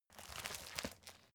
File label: folder file jacket turn